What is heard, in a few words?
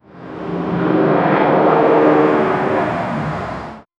airplane passing by